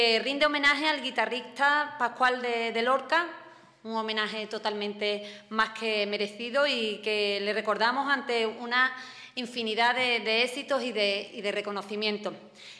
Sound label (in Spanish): Flamenco